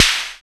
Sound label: NOIZSLAP SN; Drums In Your FACE!